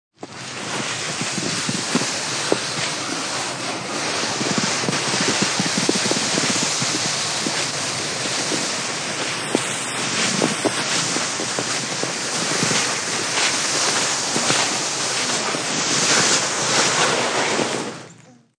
Draggin a sled through snow; Dragging (Sound Effects:Objects)

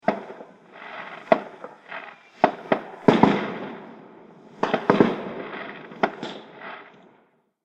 Fireworks Multiple Bangs With Ambience; Firework Sound Effects; Celebration, Party

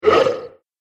Troll Surprised Growl; horror; Monster

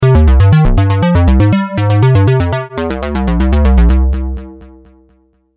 Videogame Music; Andrew Fleming

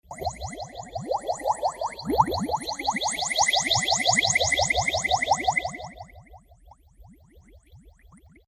Alien Machine Scanner Analyzer Warble Shimmer; Flange Bubble; Computers; BBFX

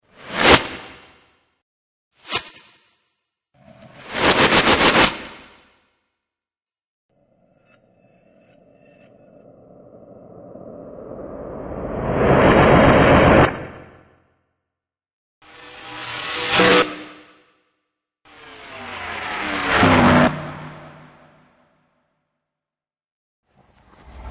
Eerie Whoosh Mono, Errie Mono Whooshes; Errie Mono Whooshes-8 Takes; Sound Design

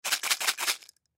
Pellet Container Shake Hard Bbs; Miscellaneous Combat; Warfare; Blastwave

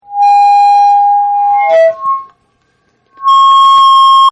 Mic feedback, Loud Mic Feedback; Microphones (Sound Effects:Electronics)

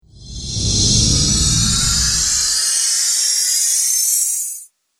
Future World Whoosh; Whoosh Sound Effects

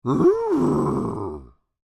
Creature, Human, Moan, Throaty; Groaning

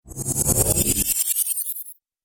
Stutter Whoosh

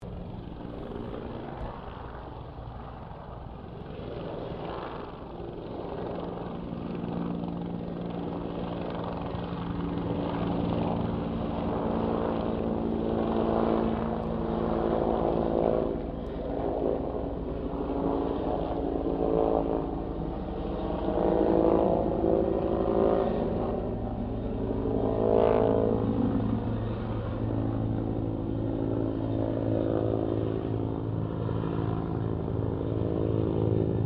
HELICOPTER BELL 204 FIREFIGHTER PASS DISTANT; Helicopters (Sound Effects:Vehicles)

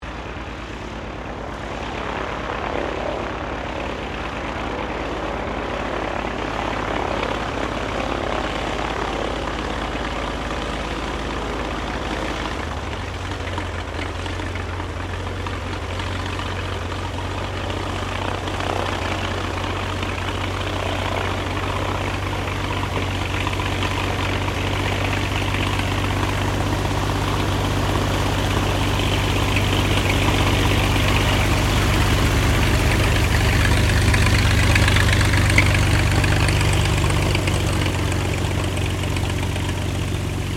AIRCRAFT CESSNA O-1 BIRDDOG SHUTDOWN; Cessna Birddog spotter aircraft; Airplanes; Vehicles

